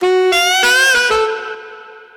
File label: Instrumental Fill 097 Saxophone Fm; KSHMR